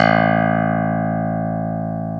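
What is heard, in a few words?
clavinet A3; AKAI S-Series CD-ROM Sound Library VOL-8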